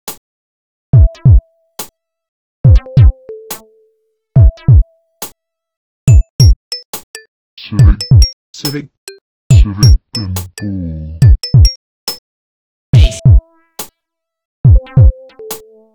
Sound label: Pinball; Civic